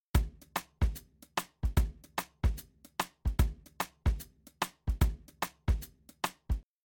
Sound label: Drums, shuffle drums; WDR2